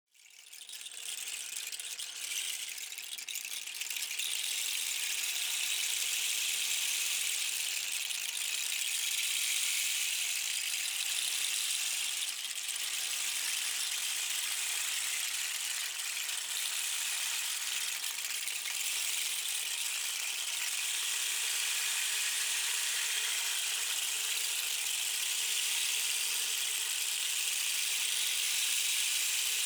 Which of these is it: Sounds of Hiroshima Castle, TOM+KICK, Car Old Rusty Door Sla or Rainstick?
Rainstick